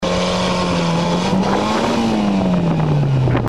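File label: wheel slipping on an uphill climb, lumps and stones fly in the dust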